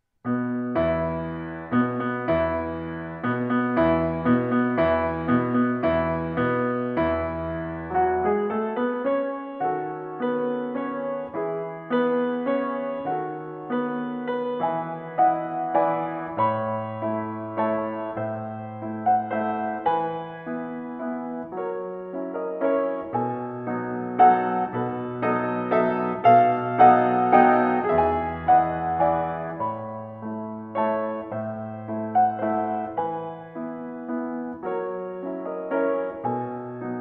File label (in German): Chopin Walzer E-Dur; Mit Fade